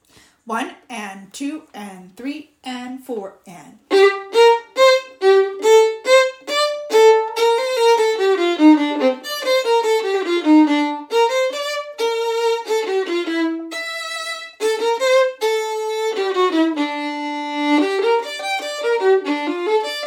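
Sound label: Bach Double Violin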